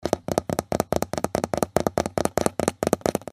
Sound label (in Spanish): Repiqueteo; Banco de Sonidos - Facultad de Art; Leitao - Cazanave - Capelluti - Suasnabar